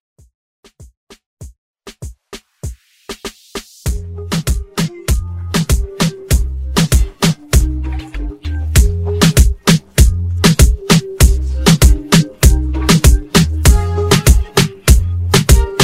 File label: Reggaeton; Redrum